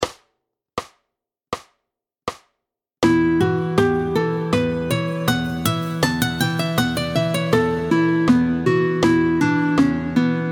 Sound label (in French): Dorien, Le mode de mi dorien, tempo 80